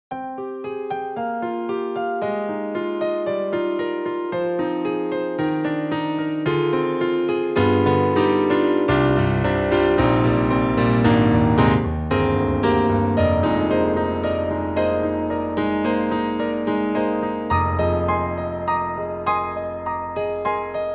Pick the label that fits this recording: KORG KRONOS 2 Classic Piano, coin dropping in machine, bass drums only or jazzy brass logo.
KORG KRONOS 2 Classic Piano